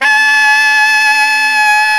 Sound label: SAX T. GROW, SAX Tenor; Tenor V-sw